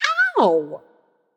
ouch; smite; voicepack; morgan le fay

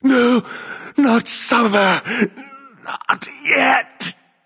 death; smite; ra

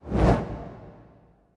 trident swing slow; smite; poseidon

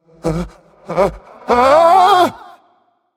fear

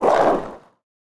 skateboard roll push; smite; god sunwukong

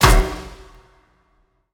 basic hit; smite; chronos

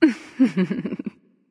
hehheh; smite; thanatos

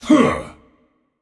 grunt attack; cernunnos

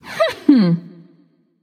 hehheh; smite; pele

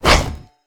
swing; smite; cerberus

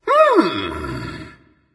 thinking; smite; hunbatz

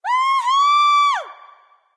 yay; smite; jingwei

